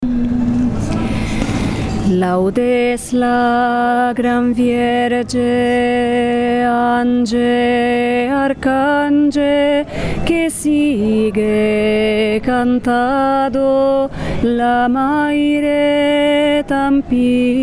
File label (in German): laudes la granviege untere stimme; SI LAUDE MARIA; nur untere Stimme (zum dazu singen)